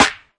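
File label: Snare; Classic Hip Hop Mega Kit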